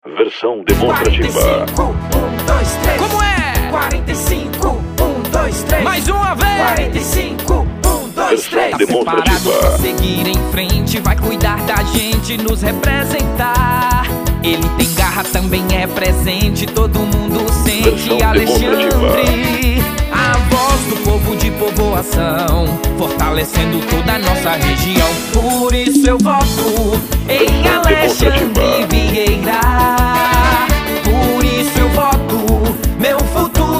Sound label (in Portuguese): jingles politicos axe jingle inedito animado; Jingles Politicos 2020